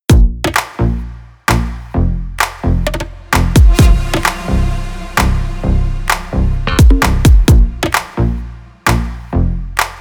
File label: summer pop